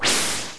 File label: shot, magic; customnpcs